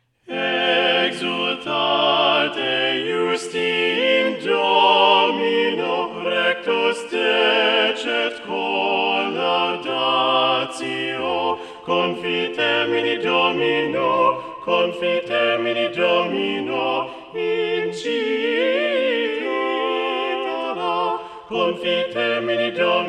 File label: exultate justi satb balanced voices; viadana